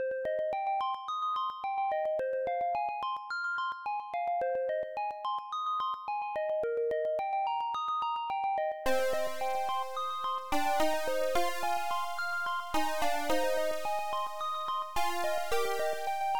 dungeon; dragon

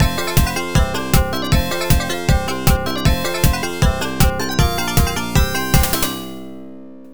bonus; prehist

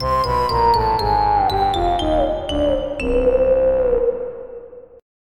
Jingle Unsuccess

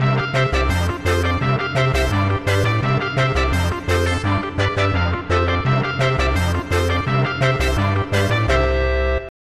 Tricky Level Jingle

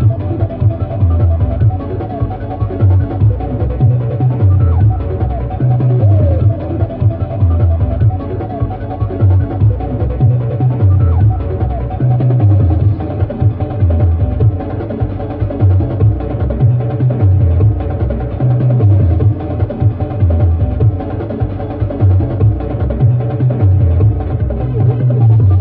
rave low; ionfury

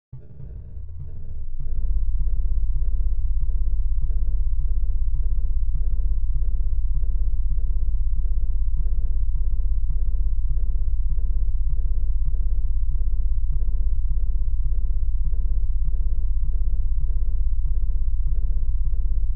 RUMBLE; darkfrcs

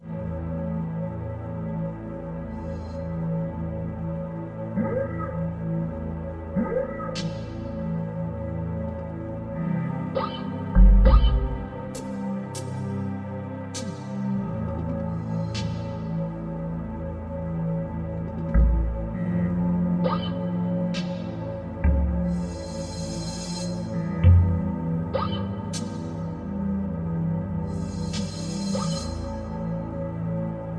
Dark Forest; skeldal

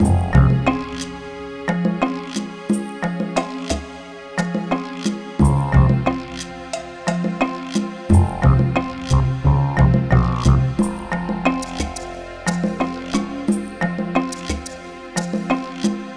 menu; gruntz

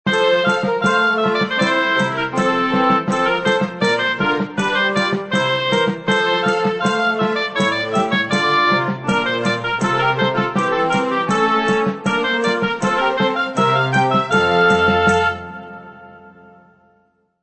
Victory; uo

